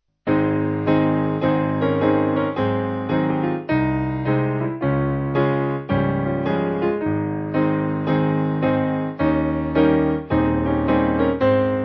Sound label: Take The Name Of Jesus, Piano; 4/Ab